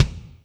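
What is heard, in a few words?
kick Dbl RM, Alesis D4 drum machine; 300 Drum Machines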